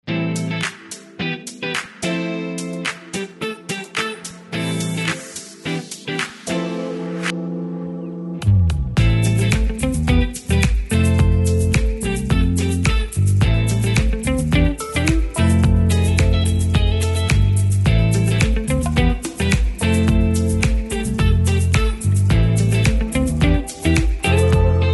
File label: urban